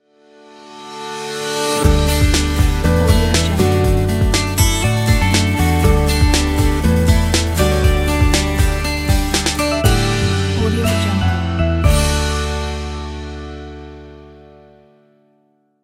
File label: motivational logo